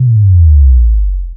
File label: Bass Drop